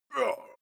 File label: male shout hit soft